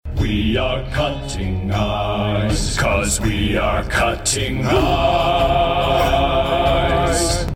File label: cutting ice